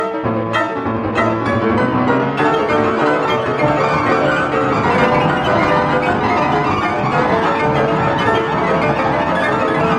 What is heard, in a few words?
piano version; tnt run